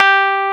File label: Keyboards Old School, G3; USB Soundscan vol.09; AKAI